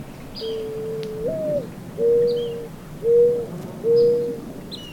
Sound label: mourning dove